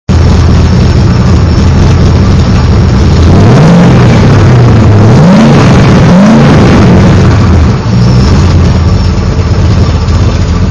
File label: single, v8 mini